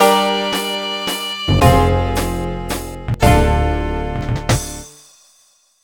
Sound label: Failure Sting; nds